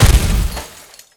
Computer Break

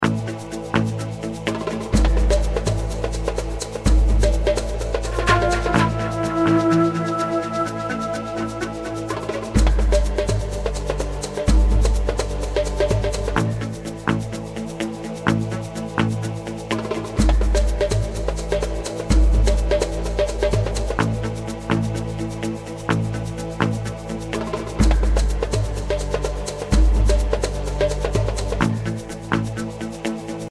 Terrorists Loop; Police Quest SWAT 2

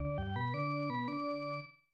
Organ Music; NHL Stanley Cup; SNES

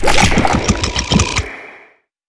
Cockleshell Clap; we are the dwarves gamerip 2016

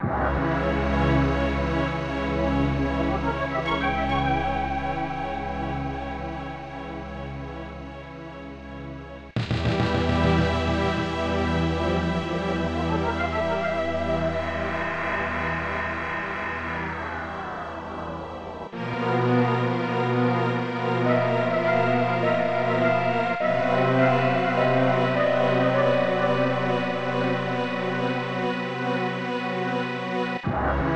Dungeon; 3x3 Eyes Seima Kourinden; SNES

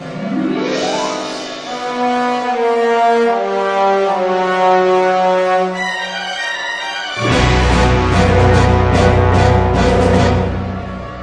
Music Minigame; cartoon network punch time explosion xl 2011 gamerip

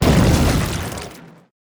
emerge from ground; dung defender

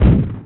Snwblhit; Buggy; PS1

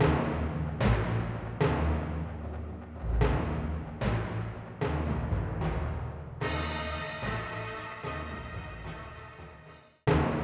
Bossbattle Fade; Might and Magic Clash of Heroes

